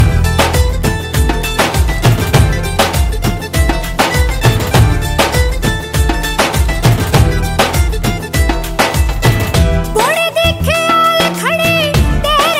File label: new punjabi ringtone